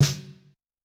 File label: Snare Deep; Yamaha DTXpress-II